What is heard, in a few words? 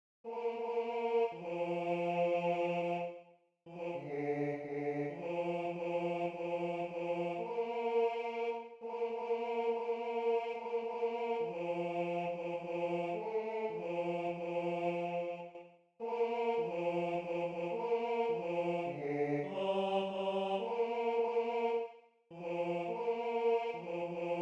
Bass I; Rorate